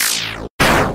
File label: slash; undertale